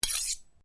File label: Blade Swish, Sword; Foley sounds for melee weapons; Swords; Combat; SoundEffects-Foundation1